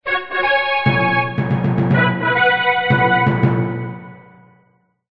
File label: fanfare; c-evo